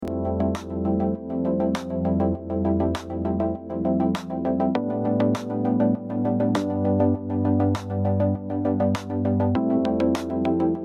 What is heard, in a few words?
Ocean Whispers